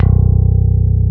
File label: ROCK BS B1, PICK BASS